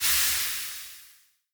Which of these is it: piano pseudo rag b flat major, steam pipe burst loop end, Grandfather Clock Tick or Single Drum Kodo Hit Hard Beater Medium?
steam pipe burst loop end